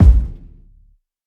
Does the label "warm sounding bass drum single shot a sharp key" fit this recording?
Yes